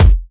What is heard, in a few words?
clear bass drum one shot f sharp key